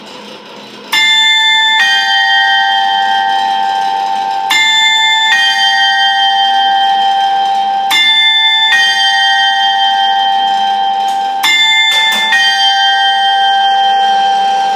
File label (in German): Turmuhr